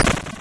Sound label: various, explosions